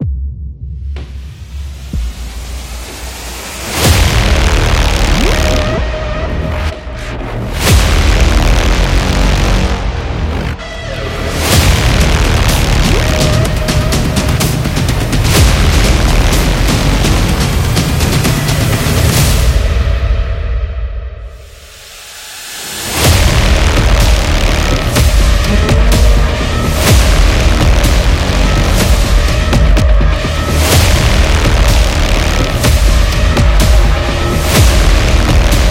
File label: Battle Trailer